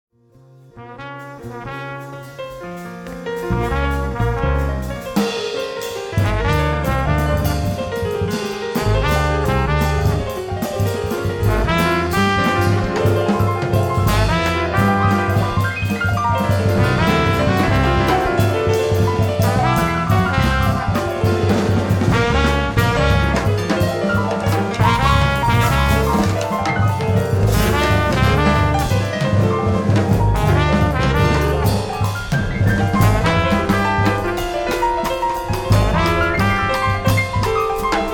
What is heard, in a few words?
Quartet Interlude